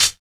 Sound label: Closed Hats